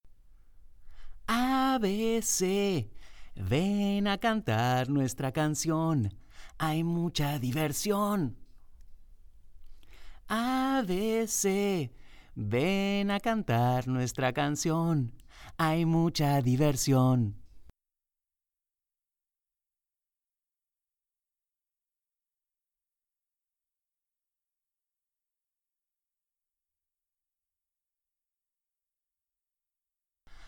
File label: Spanish LA Singing